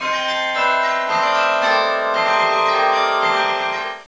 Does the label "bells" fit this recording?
Yes